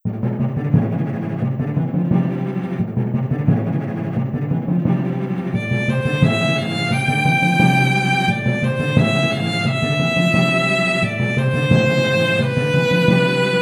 cult battle

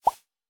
Dialogue Box Grow

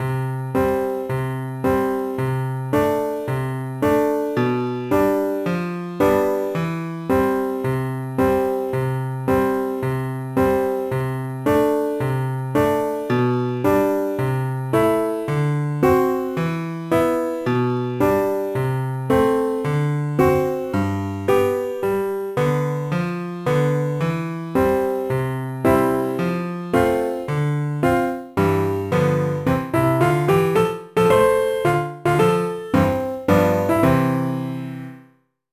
BGM PIANO

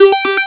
KEY Hold Start

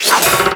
Character Defence; NYC

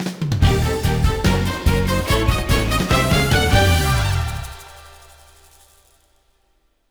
You Got An Item (Lottery Win); blitz brigade; android

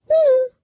Hold scrotch fidgets head shake

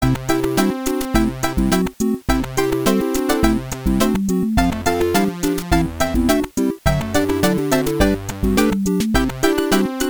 Song Jingle Bells; Infectonator 2

